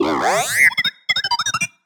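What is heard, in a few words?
Sci-Fi Droid Robot Emote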